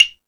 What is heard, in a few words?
Latin Layers, drum machine; E-MU Pro-Cussion-1